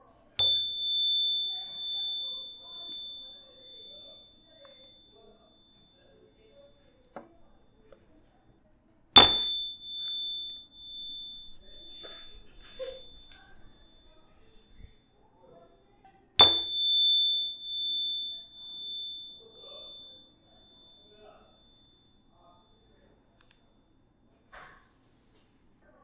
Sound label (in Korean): 벨; 민희